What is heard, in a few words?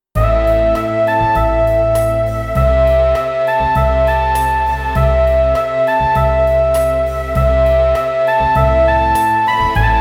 New Melody Flügelhorn, Chor